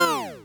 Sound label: Retro Game Weapons Sound Effects